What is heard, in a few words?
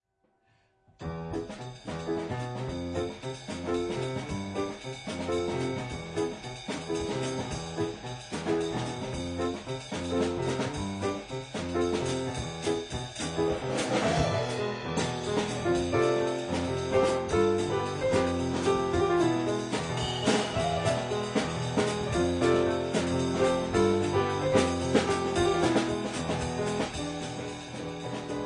piano drums